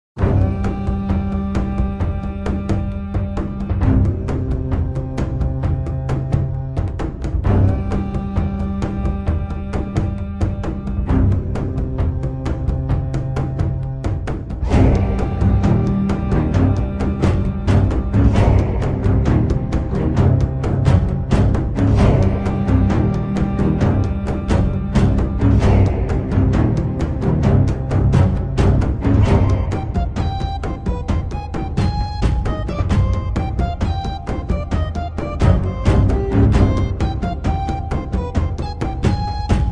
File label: Viking Music; Medieval Total War